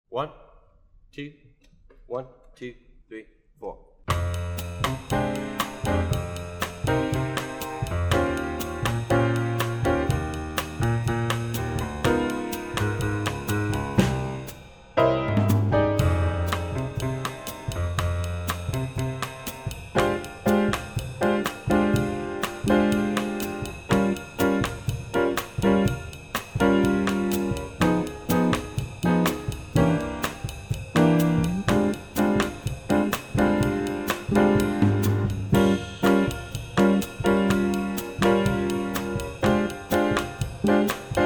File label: latin bass muted